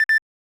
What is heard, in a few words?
Timer Count; bs zelda; snes